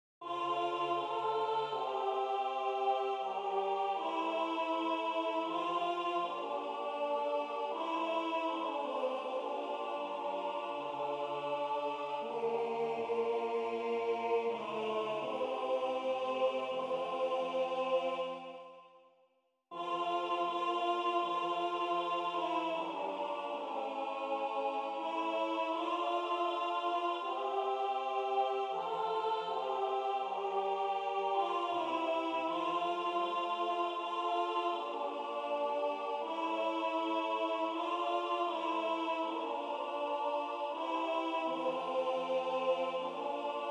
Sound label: Haute Contre; Revecy venir du printans Teil 3